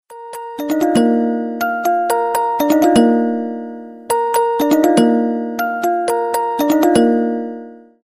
standartnyj zvonok; Стандартный звонок; Delta

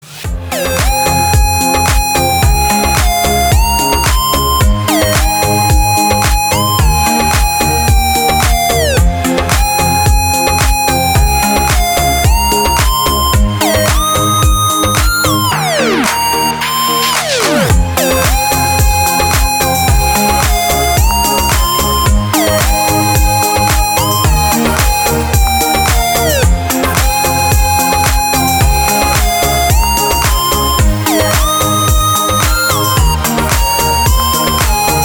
gromkij rington

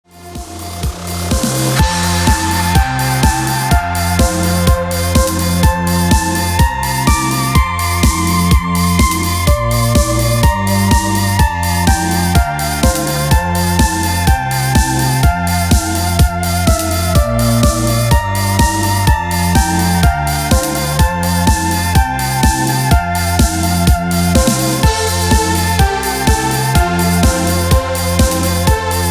novogodnij rington na zvonok

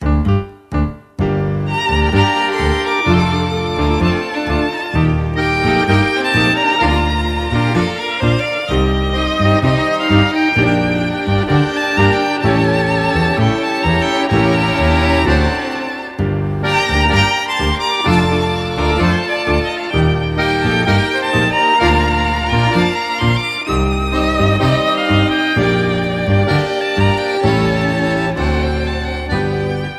argentinskoe tango

